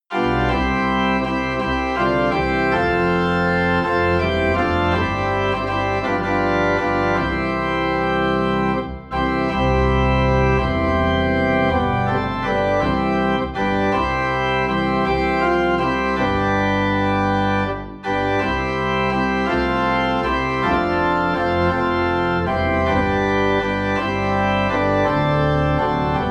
Organ Low in C; We're Marching to Zion

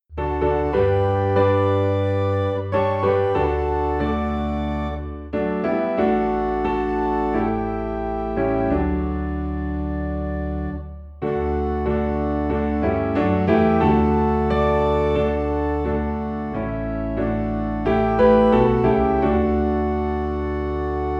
Piano and Organ - High in D; Savior Like a Shepherd Lead Us

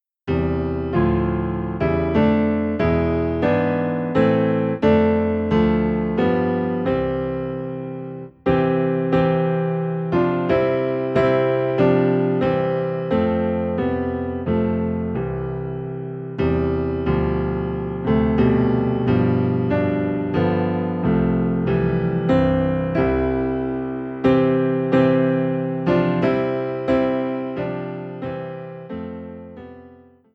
Piano Low in C

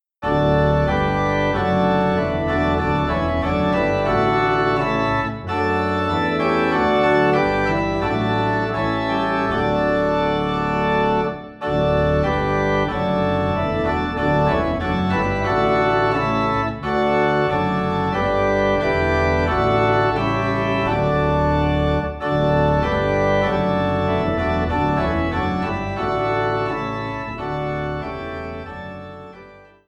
Organ Low in D; Guide Me O Though Great Jehovah

